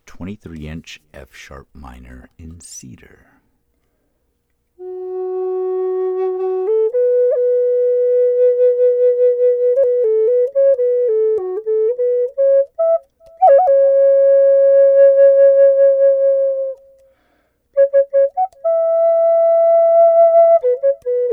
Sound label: 23 inch F Sharp Minor Cedar; F#m Cedar